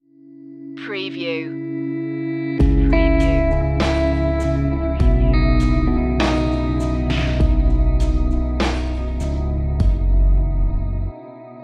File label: sedated stinger